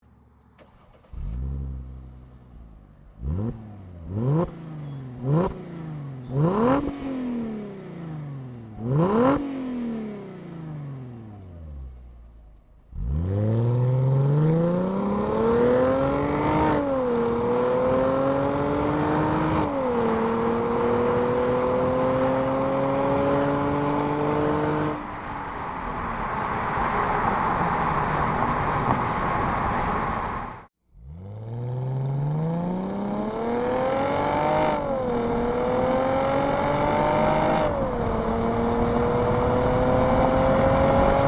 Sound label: Mercedes Benz E350 Coupe